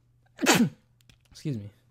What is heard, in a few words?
sneeze